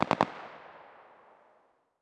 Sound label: ambient; World of Tanks